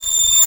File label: Multimedia Internet CD-Rom Flash Electronic